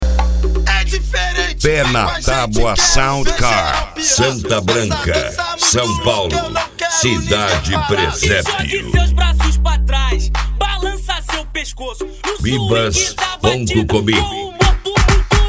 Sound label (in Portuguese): sound car carnaval